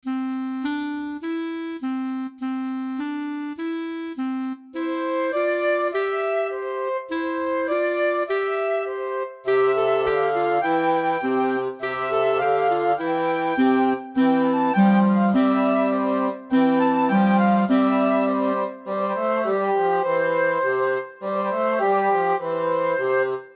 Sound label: Frere Jacques round clarinet and flute